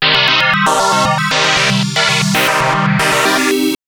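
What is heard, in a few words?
Synth FM Pad minor c2; TrashLibrary; FMCycesPad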